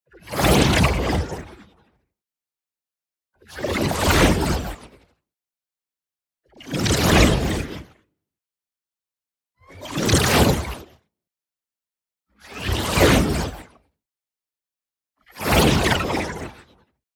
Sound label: WHOOSH SMALL Energetic Projectile; boom library; Matter